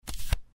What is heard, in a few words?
card flip